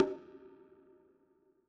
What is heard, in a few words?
perc percussion; RockScience